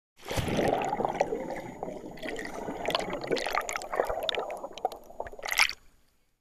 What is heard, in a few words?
water